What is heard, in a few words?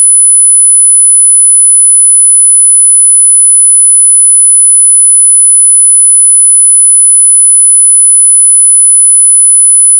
10k